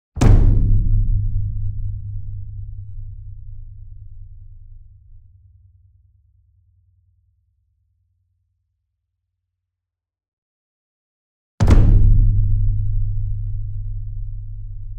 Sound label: ENSEMBLE DRUM Bass Drum Concert Large Flam Near; boom library; Cinematic Strikes Construction Kit